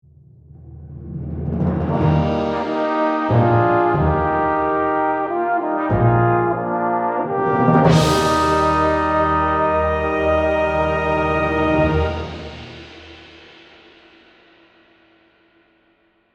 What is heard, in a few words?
Group Bell